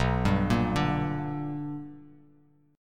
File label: B-Minor Major 7th Sharp 5th B down; BmM7#5 arpeggiated; JGuitar